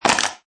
Metal Can crunch; Metallic; Multimedia; Lynne Music

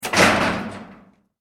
household door garage metal shut exterior; Metal Garage Door Shutting - External Recording; Garages; McKinney Sound

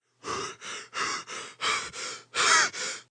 Panting, Male gasping, hyperventilating; (human voice); Sound Effects:Voice; VoiceBox

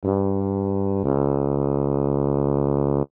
Melody Low Sad, Toy Melody; Toy Melodies; Toys; Blastwave

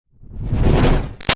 Whoosh Power Pulse Strong; Whooshes; Sound Design; Big Room Sound

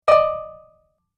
Message Error, beep

